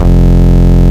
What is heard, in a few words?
Root F, Bass